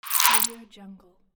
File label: modern whoosh